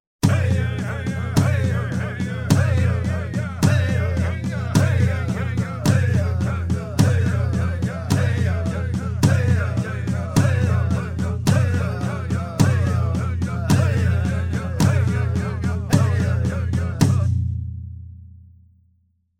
Indios, batuque de indios

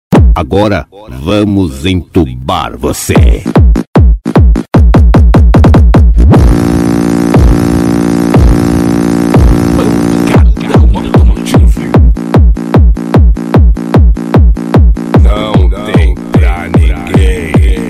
pancadao de som automotivo

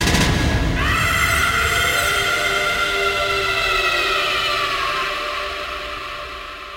tiros e gritos

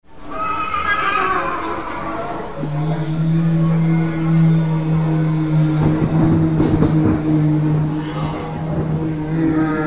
toque de terror; toques para celular

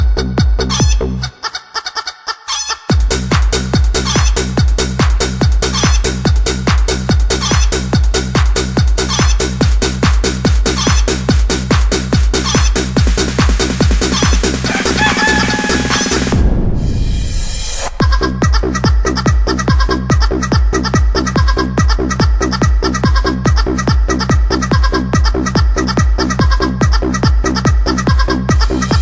nova musica do galo acordando

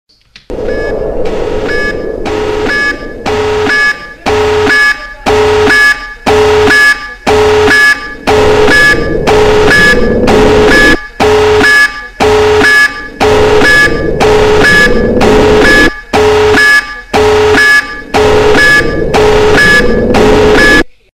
mensagem de alerta nuclear

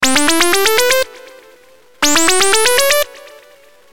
mensagem de sms som

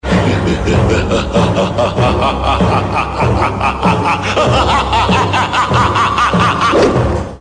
risada maligna

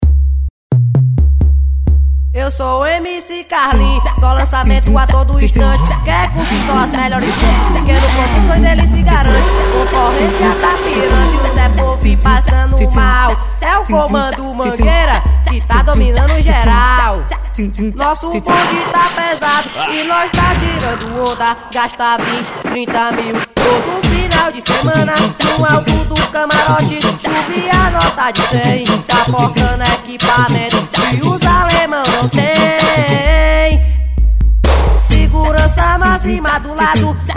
funk ostentacao

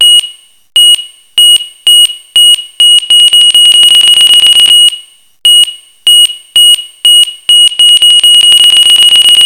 bomba relogio alerta; toques para celular

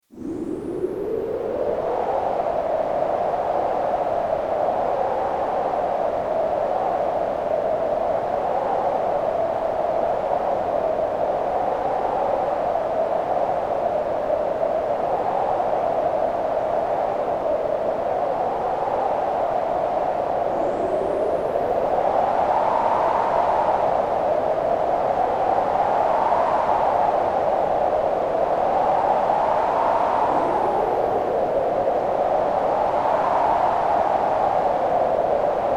ventos efeito sonoro

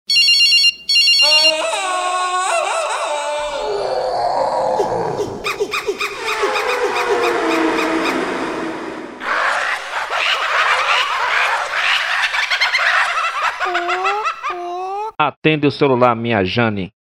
som de selva

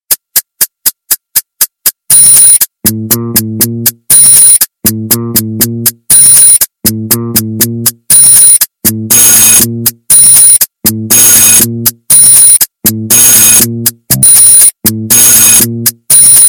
musica de despertar

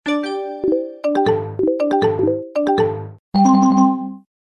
chat do facebook vs msn

